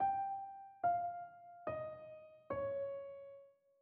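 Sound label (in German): Tetrachord Phryg g ab, phrygisch; Tetrachorde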